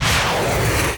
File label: sfx punch; Animation Throwdown